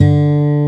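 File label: D 2 MARTIN, acoustic guitar; AKAI S6000 CD-ROM - Volume 1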